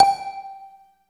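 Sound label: MARIMBA G4; East West - Phat & Phunky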